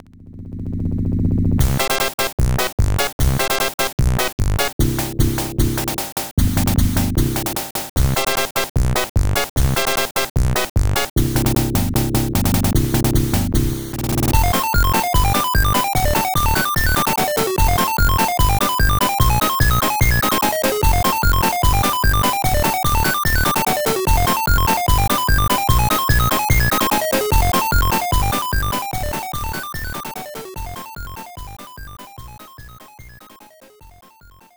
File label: Battle Victory; Mega Bomberman; Genesis